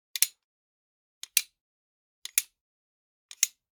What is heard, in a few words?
Generic Click Metal Tiny; boom library; The Interface